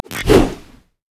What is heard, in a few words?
explosive cask; LoL; gragas